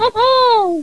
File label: giggle